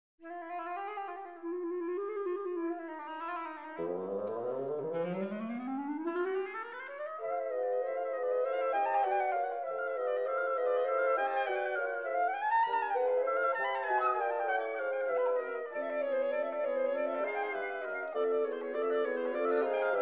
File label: scherzo for woodwind quartet